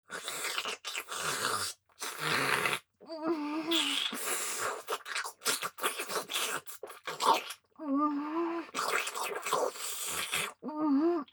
咥えフェラ（激しめ）

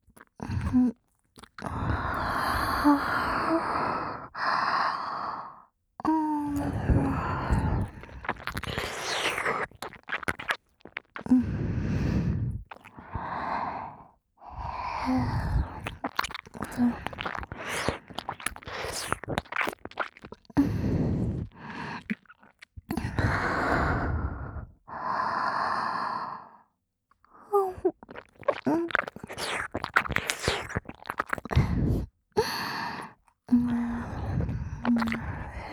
耳舐め; おまけループ音声

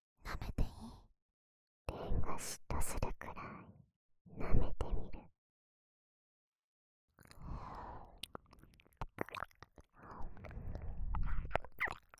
耳舐め; 志乃と恋からの耳舐めご褒美

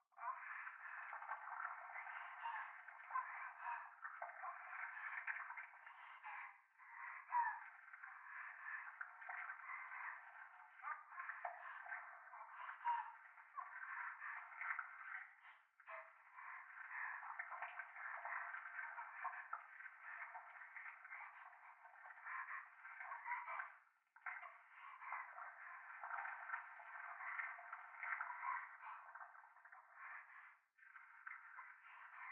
トイレ 右耳密着処女喘ぎ 触手

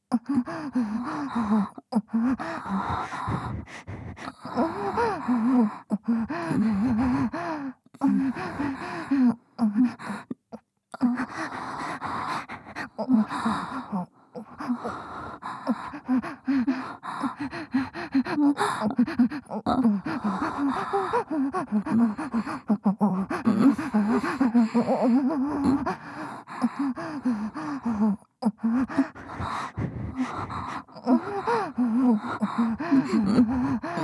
耳舐め; シャーロット

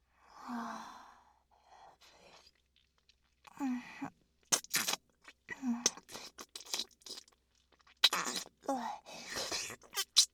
フェラチオ; SEなし

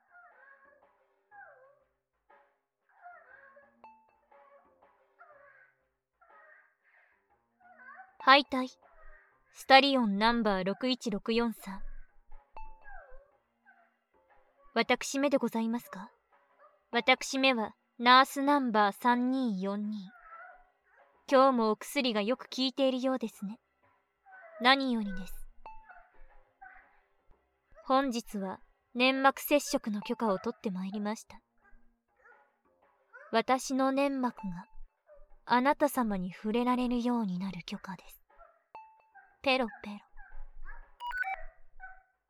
耳舐め吸引; 搾精とっても若い処女の子宮に着床したお話

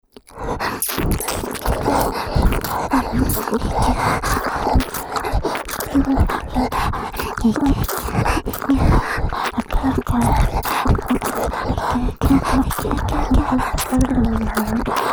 W耳奥舐め＆授乳手コキループトラック, ASMR

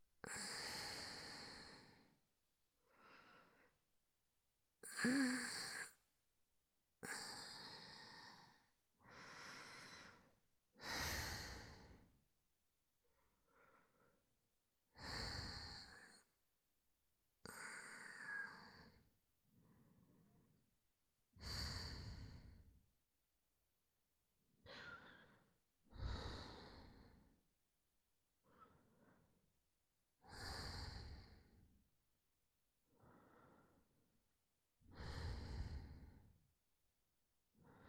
寝息; SEなし

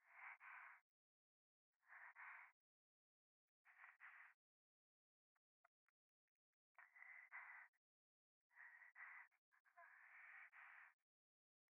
左耳密着吐息

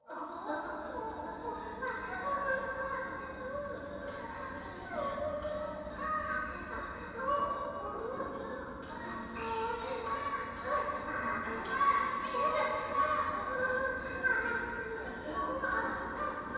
トイレ 低音喘ぎ 電車

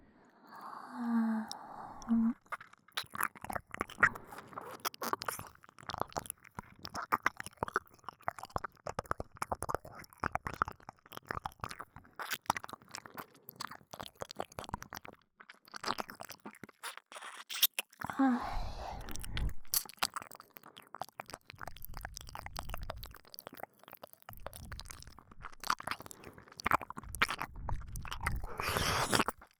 左耳舐め, ASMR

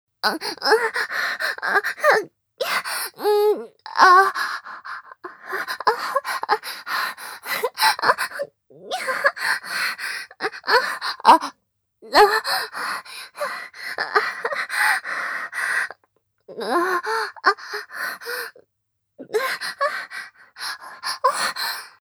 喘ぎ声 （騎乗位・激しい）

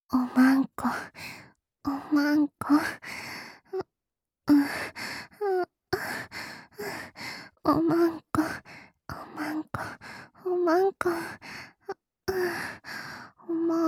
ゆったり喘ぎ

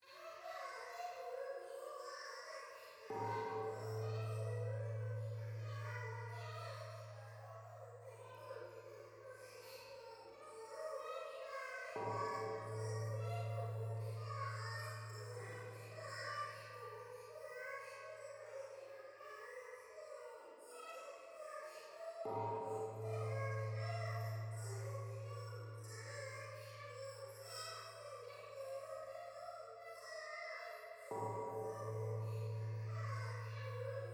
可愛い 喘ぎ 神社; 夢の中

